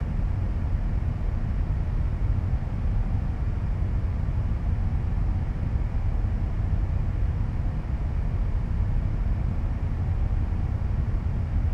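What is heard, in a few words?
ambience boiler room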